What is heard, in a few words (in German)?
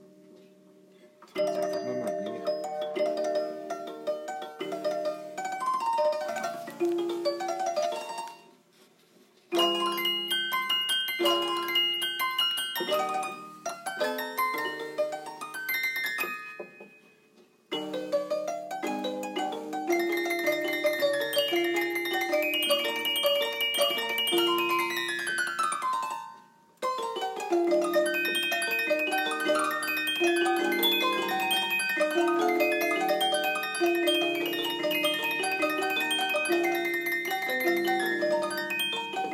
musikdose zittereffekt; drehorgeltag 2018